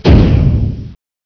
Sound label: door stop; gearbox